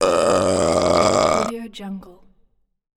burp

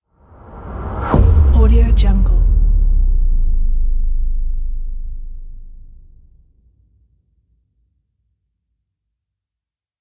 whoosh to bass hits; garnavutka-nonexclusive

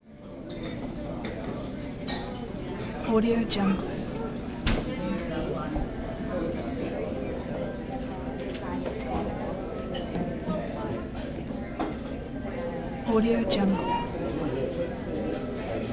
diner crowd walla busy restaurant

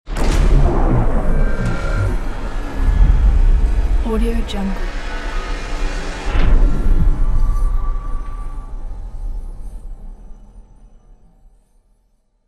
futuristic door open; infinity-sfx-productions

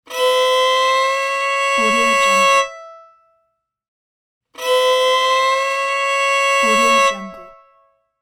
bluegrass fiddle lick; applehillstudios

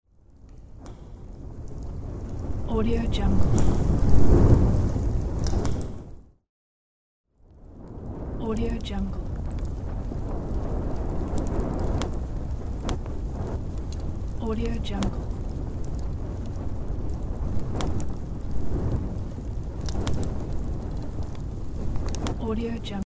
fire

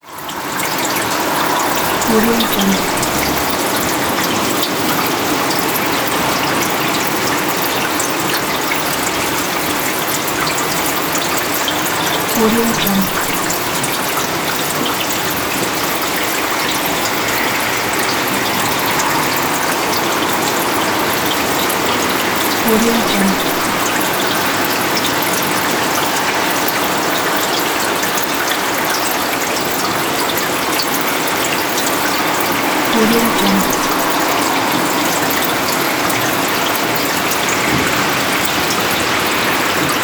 city rain; lucamanzella

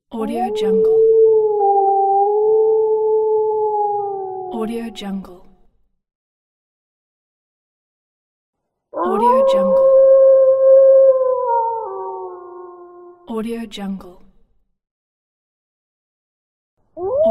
wolf howling; soundforces

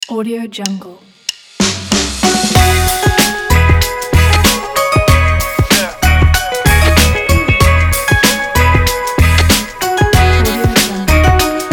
simple hip hop promo

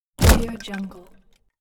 large wood hit

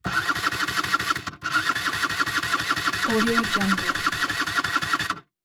scooter false start; soundbits-soundfx

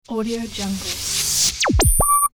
transporter energy; sound ideas

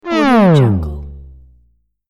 cartoon slow slide

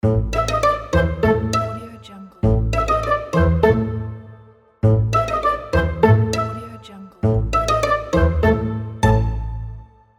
comedy logo; kolokol

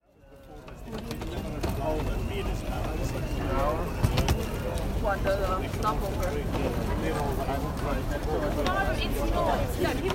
international airport atmosphere; cinemandre

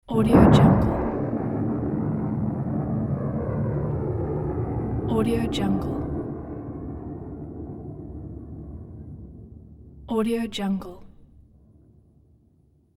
missile impact explosion low

